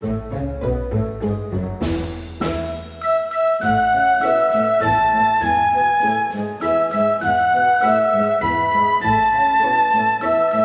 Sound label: Flute Happy Birthday